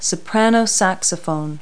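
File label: soprano saxophone; Multi-Lingual Talking Picture Dictionary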